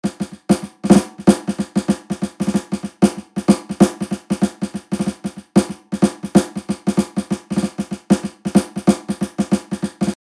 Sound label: new orleans snare drum